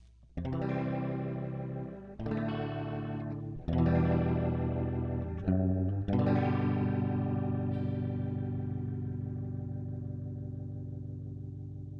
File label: guitar chords full model output fast